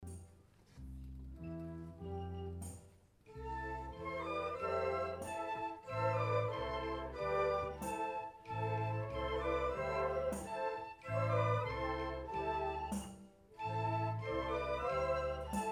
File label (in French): Enregistrement tascam concert postlude; Roman de Renart; Marcheprime 31 janvier 2016